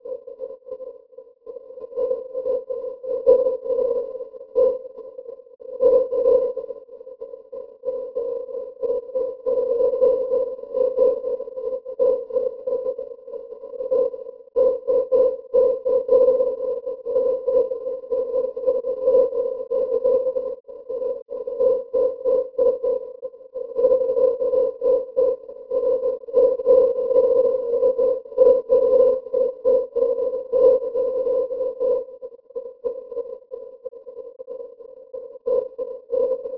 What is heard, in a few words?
Jazz